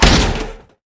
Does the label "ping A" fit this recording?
No